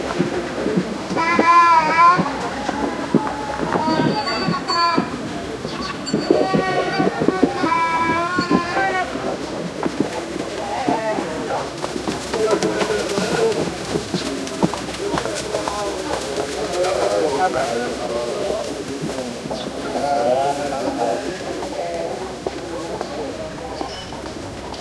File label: an ancient busy middle ea, middle eastern